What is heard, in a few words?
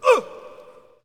Hockey Male Hurt; SFX Kit; Hockey Vocal Sounds